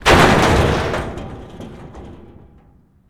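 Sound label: metal object drop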